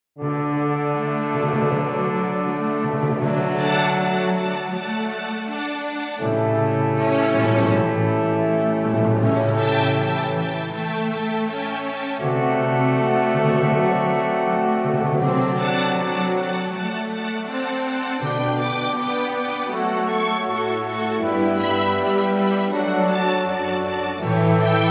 Instrumental; Mozart; Requiem

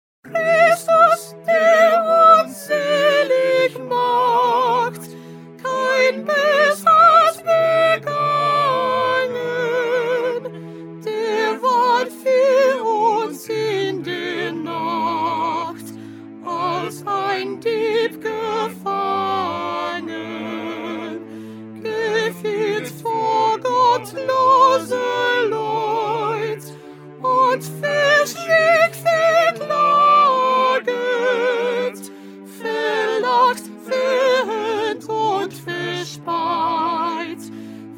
Soprano; Bach; St John Passion

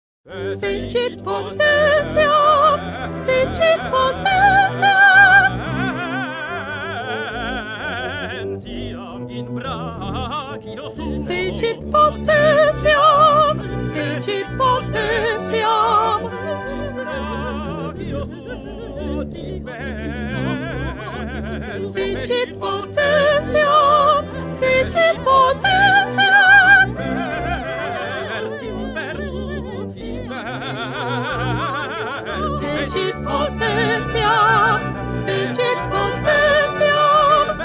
First Soprano

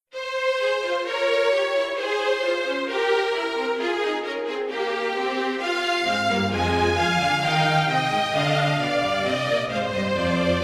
Instrumental; Pergolesi; Stabat Mater

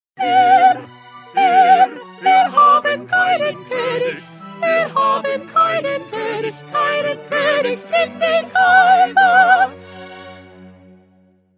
Soprano; Bach; St John Passion

